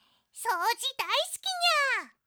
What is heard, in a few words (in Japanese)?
ごみ箱を空にする